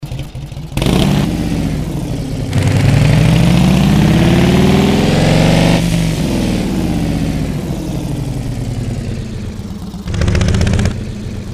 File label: Indian Chief Bike